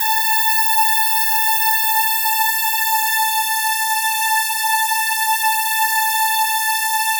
SIZZLE A6, SIZZLEPAD; Drums In Your FACE!

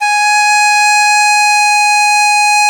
DIZI G#4; E-MU Producer Series Vol. 7 – Old World Instruments

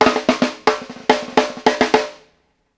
STANDARD, FILLS 108; Sampleheads - New York City Drumworks VOL-1

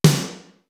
W VERB; East West - Global Sounds; D4 BD SD TOM

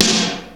Fairlight CMI III Snare

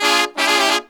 Pop & Funk Brass

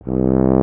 tuba C; Miroslav Vitous; Solo Instruments VOL-2

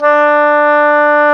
oboe; Roland LCDP04 Orchestral Winds; Oboe Brth 1

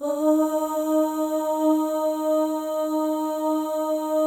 OH, FEM, Symphony of Voices; Spectrasonics

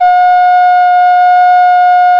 ALT F#3, MOECK; SoundBytes - Early Patches

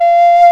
HRP RCDR&FLT

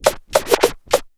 TURNTABLES, Hip Hop

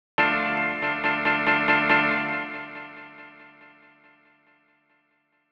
Piano Riff D, Grime, 140bpm; Zero-G Sound Sense Grime Scene; GS Construction Kits; Just Flex

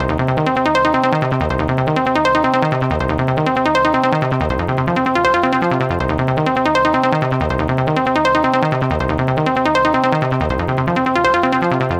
gro 160, Hardcore Loops, Arpeggios

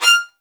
F6, LOUD VIOLINS; Q Up Arts - Denny Jaeger Master Violin Library

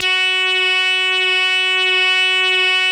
SQUEEK, LEADS; Masterbits; Vintage Voltage

